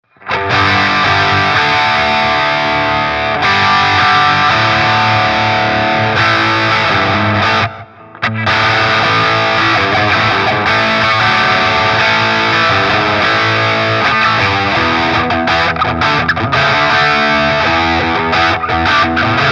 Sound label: Bogner Ecstasy Mini Amp Head Variac ON Freq B2 Gain 10 h